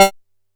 G4, Bass; E-MU Producer Series Vol. 6 – Analogia Project; Boom da Bass

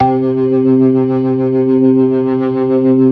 ORGAN 4 DRAWBARS; Keith Emerson Signature Series

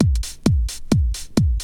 Bite Size Beats

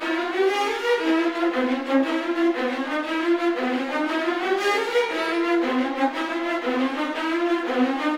GRO, Orchestral Loops; 128 HITCHCOCK

